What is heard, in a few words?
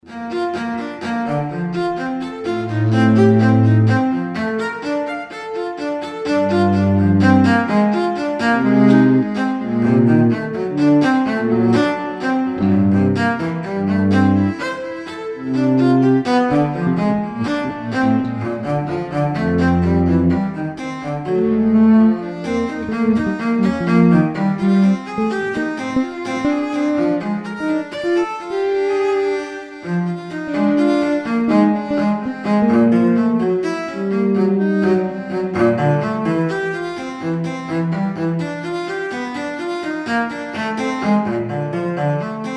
arpegium